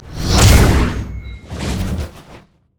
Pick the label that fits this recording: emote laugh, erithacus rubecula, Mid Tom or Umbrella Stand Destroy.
emote laugh